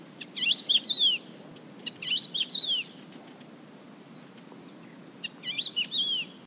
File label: crested lark; minetest; ambience